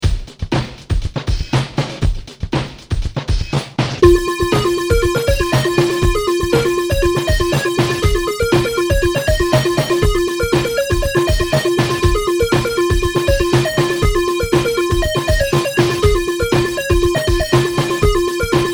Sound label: arp